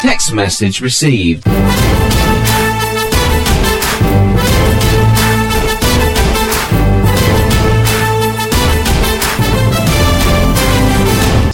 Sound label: Star Message Tone